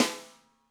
Snare; Drums & Percussion; One Shots Drums

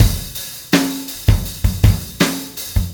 Acoustic Drumloop

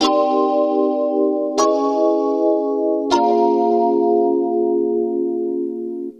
E. Piano

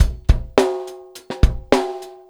Acoustic Drumloop

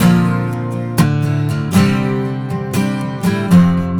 12 String Guitar; Stringed Instruments; Acoustic Guitars

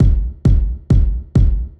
Dance Drumloop

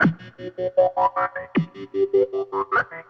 Distorted Guitar

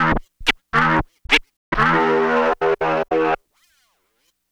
Scratching, Vinyl Scratches

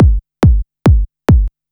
Dance Drumloop

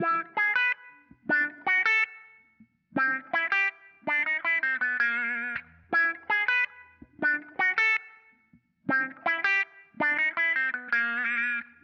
Funk Guitar; Stringed Instruments

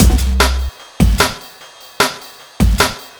Breakbeat, Drums & Percussion

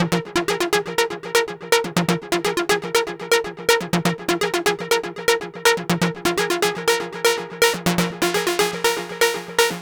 Synth

